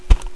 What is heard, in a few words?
Footstep; Curse of Dragor